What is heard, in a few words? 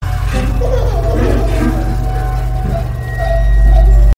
Cry Baby laugh